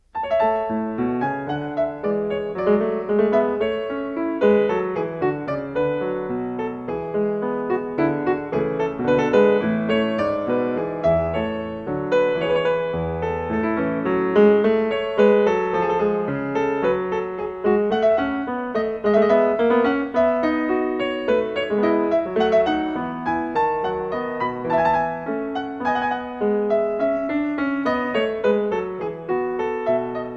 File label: Courante I; Glenn Gould; Bach The English Suites Nos. 1-6, BWV 806-811